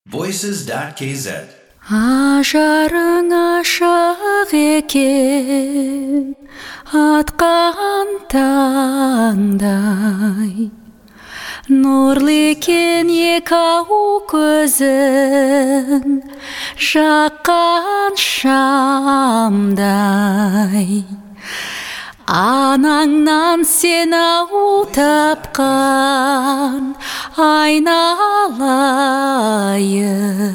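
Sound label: Kazakh vocal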